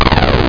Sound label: Weapon Wrench; CephaloTron